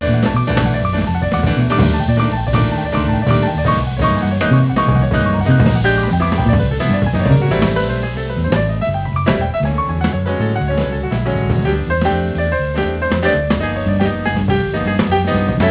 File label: jazz